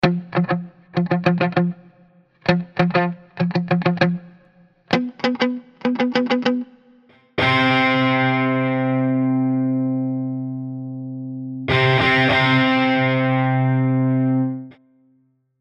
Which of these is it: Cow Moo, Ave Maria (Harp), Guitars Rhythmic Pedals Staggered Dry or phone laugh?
Guitars Rhythmic Pedals Staggered Dry